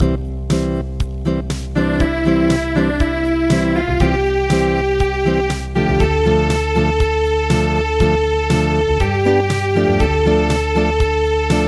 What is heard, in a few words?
Acoustic